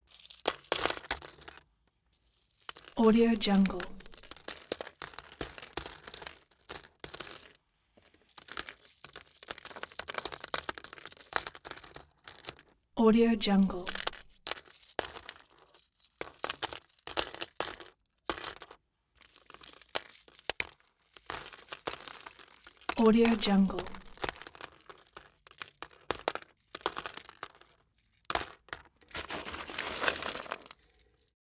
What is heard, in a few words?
object glass debris fall sequence long